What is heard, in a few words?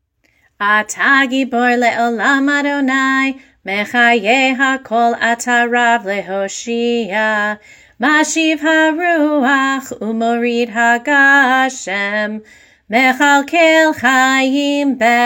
Gevurot winter chanted; bnai mitzvah; barbat mitzvah